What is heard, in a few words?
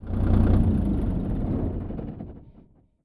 eng off, Volvo 9900; Vehicles; AI volvo 9900; Freyfurt